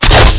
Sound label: clipin, weapons; k-volt